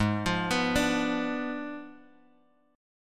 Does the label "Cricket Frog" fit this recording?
No